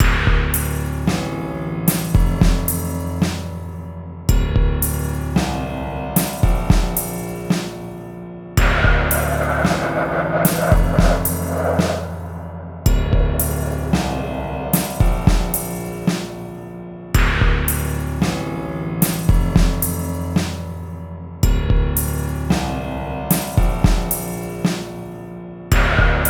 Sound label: trippy; fy tubehouse